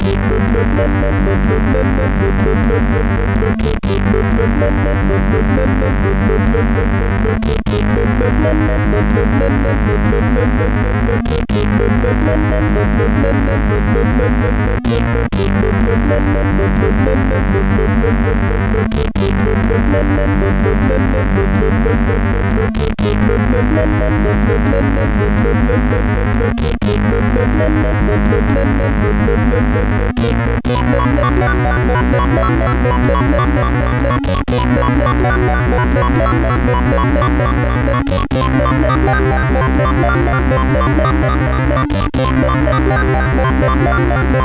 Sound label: note, CSG8580R5; hvsc